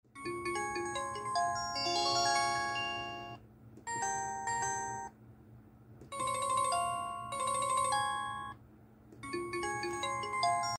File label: Pantech, زنگ گوشی